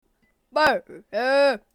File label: behehe